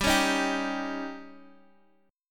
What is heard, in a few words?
Eb 7th